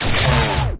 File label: Energy Rifle Fire; Terraria